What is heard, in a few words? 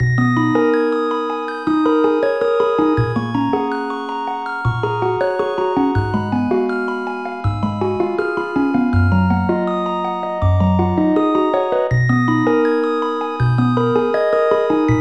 menu music; tfc; zelda